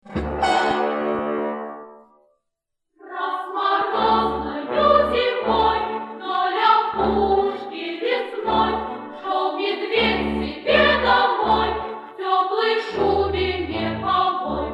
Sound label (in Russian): Большой детский хор, Детская музыка